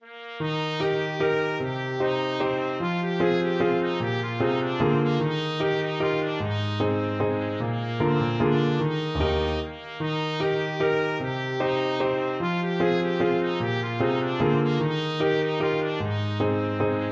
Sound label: beauty in tears trumpet